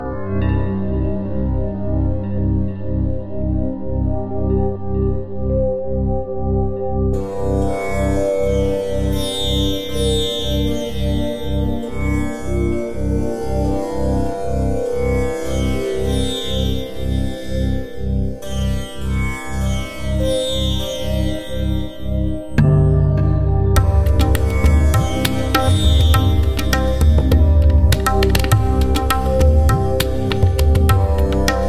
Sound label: Chord